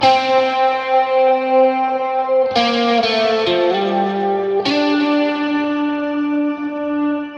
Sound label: 130 G Phrase Lead Strat; Phrases; Zero-G; Future Wave